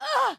Enemy General Female Death

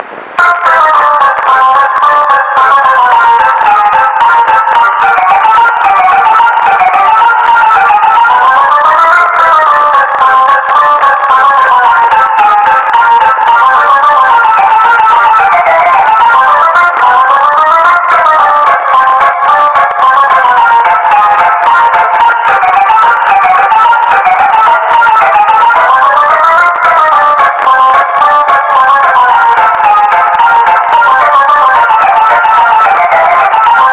Organ Circus Theme Fuzzed; Rage; Westar

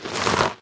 Roots evil; Rotten Forest

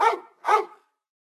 Dog barks; The thing achievement

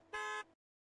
Car Horn; pulling no punches

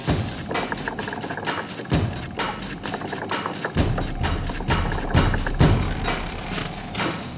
steampunk suspens rythm; mountain world

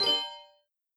Instr T piano; Backpack Hero

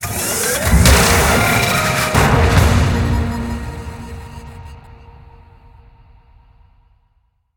Stinger Title screen; Turbo Shot

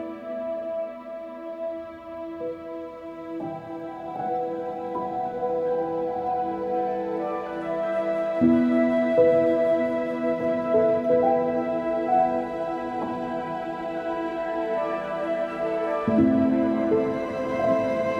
Sad Theme loop; There Is No Game: Wrong Dimension

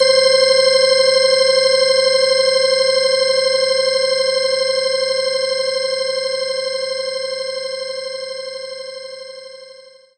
Organ Fast C4; knights try

